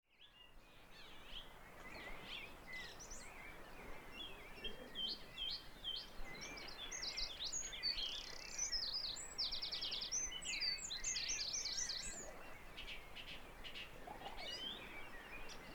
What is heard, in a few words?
Dawn chorus